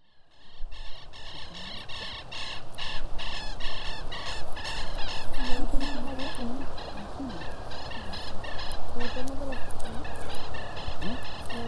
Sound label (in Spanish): FRENTE RIO MAIPO SENDERO ECOLOGICO QUELTEHUES; paisajes sonoros